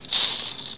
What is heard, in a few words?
CAST